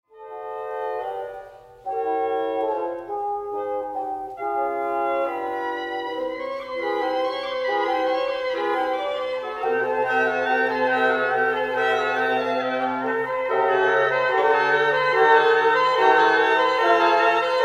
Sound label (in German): Holz Ensemble